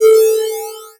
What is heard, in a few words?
Multimedia Internet CD-Rom Flash Buttons Electronic